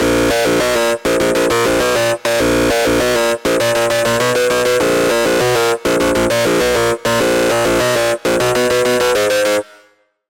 Lead; Routed Sound; Spire Expansion - Doom Carpenter Vol.1

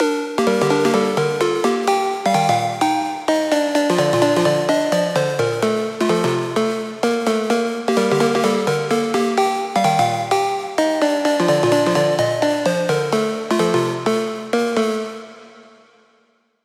Lead, Spire; Galactic Bulge; Routed Sound; Spire Expansion; Star Scavenger Vol.1

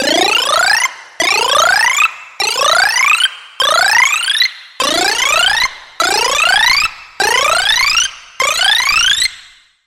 SFX - Ramp Up; Routed Sound; Vital Expansion - Hyper Gamer Vol.1

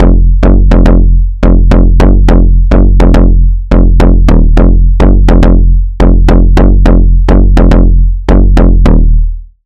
Bass; Warfare; Routed Sound; Vital Expansion - Noble Gangster Vol.1

